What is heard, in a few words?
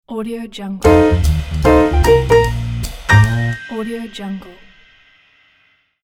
jazz logo